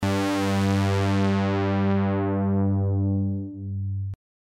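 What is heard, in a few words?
filter cutoff; diva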